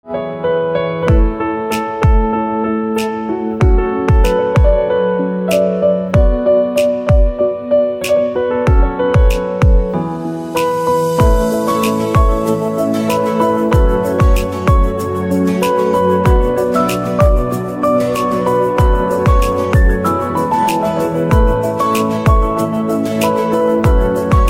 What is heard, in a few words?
Piano Instrumental Ringtone